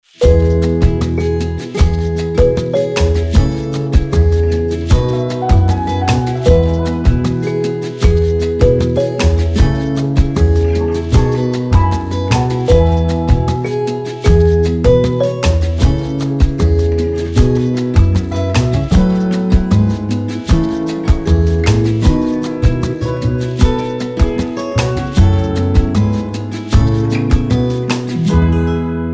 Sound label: (INSTRUMENTAL)